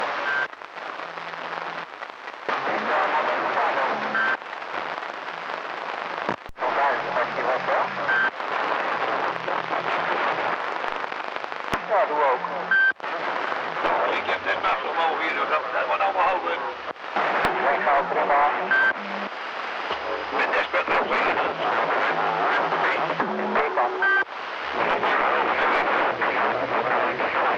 Radio Strange Recording

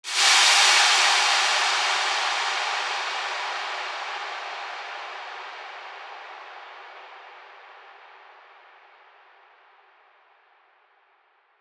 Downlifter; SFX; Downlifters

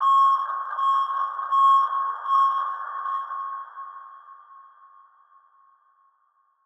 Truck, Beeps; SFX

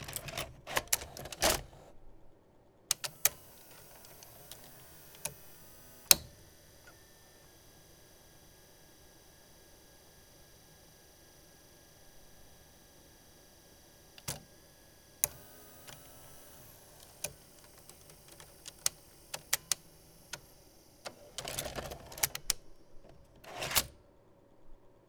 JVC BR Tape Insert & Eject; SFX; IRL Recorded

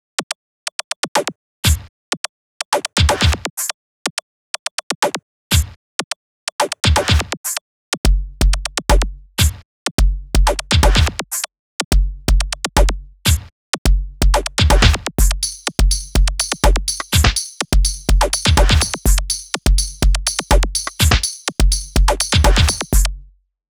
High 124 BPM, Loops

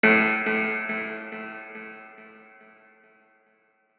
Red Light, beeps; SFX

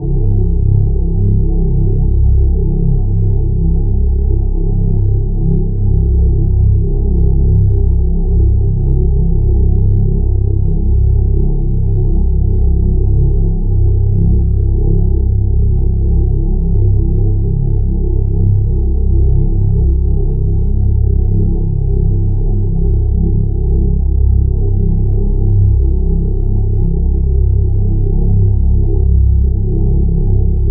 Reese Bass; 808

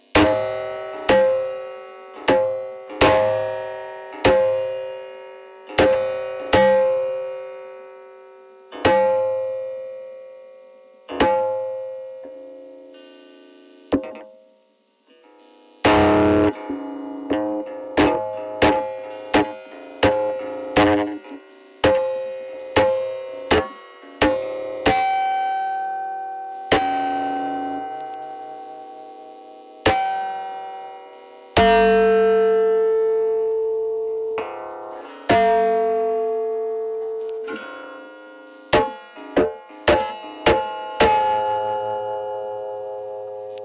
Guitar Hits; SFX; IRL Recorded

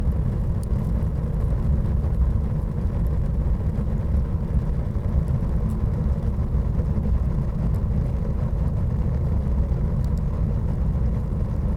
kamin; SFX; IRL Recorded

